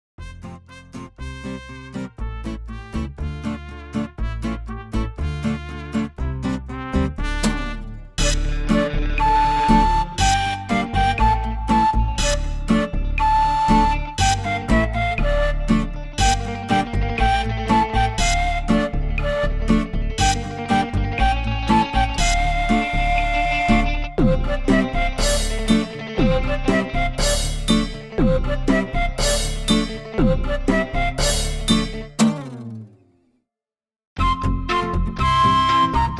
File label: flute